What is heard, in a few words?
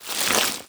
close, zombie blind, foley; creatures